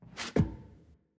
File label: Footstep Metal Rotate